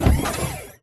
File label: Sentry Turret Body Servo Start; Boost Card; Titanfall 2